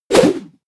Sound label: whoosh throw